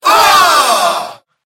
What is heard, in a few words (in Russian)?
zvuki nepriiatnogo shoka u cheloveka